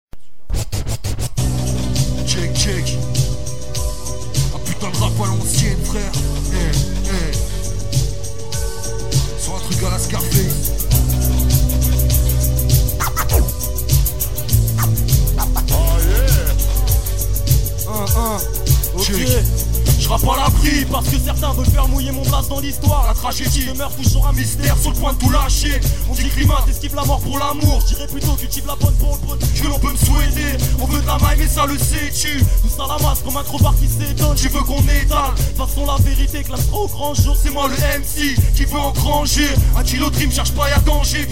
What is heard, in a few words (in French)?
(remix) Live; Live Bellevue